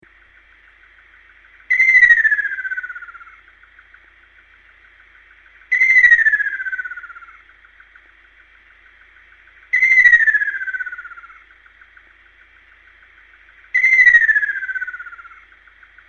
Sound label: bird